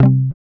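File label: GUI C, acoustic guitar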